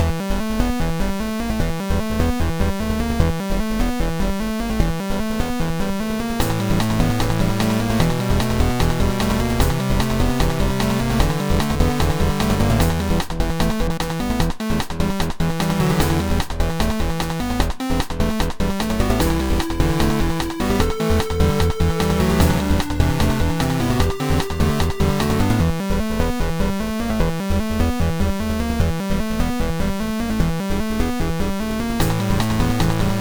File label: intense moment loop